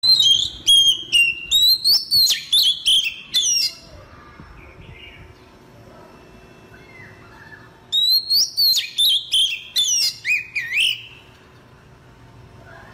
Nhạc chuông tiếng Chích Chòe hót; tiếng chim chích chòe hót

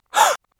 tiếng giật mình giọng nữ; hieu ung am thanh

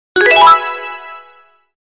tieng chuong nhac nho; hiệu ứng âm thanh; thông báo cho điện thoại và máy tính

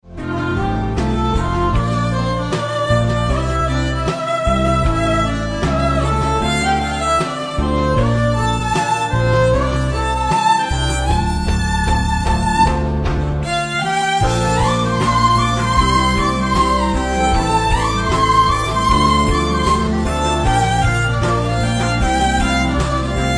Không Lời Violin; hòa tấu Violin; Giai điệu du dương, sâu lắng; TikTok; Phiên bản hòa tấu Violin

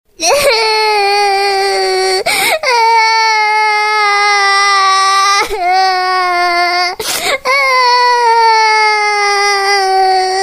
tiếng cô bé khóc; tieng con nguoi

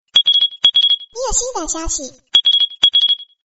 nhac chuong tin nhan trung quoc

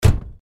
tieng dong cua xe oto

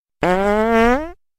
nhạc chuông tin nhắn đánh rắm; tiếng chuông tin nhắn sms, chuông tin nhắn messenger

